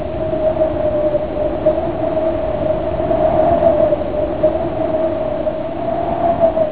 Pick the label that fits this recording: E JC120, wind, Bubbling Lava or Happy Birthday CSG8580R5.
wind